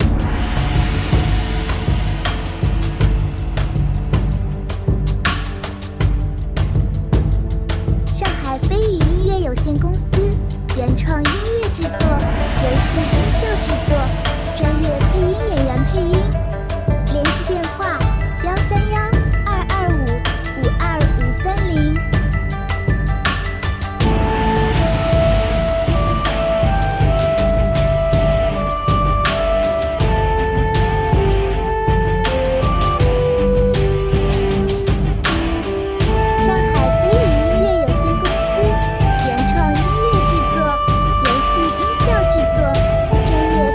中国风摇滚热血节奏; 三国战纪

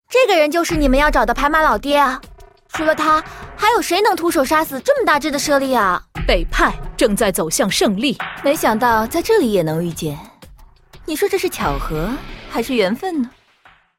女 BGM

